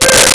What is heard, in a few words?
Clarinet C5; Nintendo; July 2020 Gigaleak